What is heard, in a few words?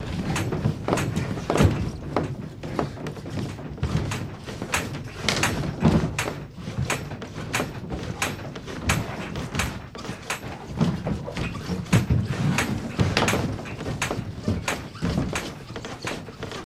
Truck Bed Int Squeak, hits & rattles